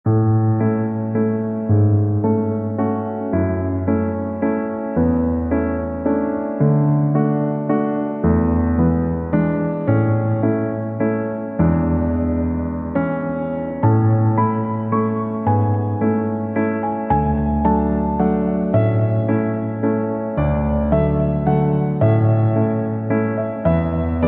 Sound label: Piano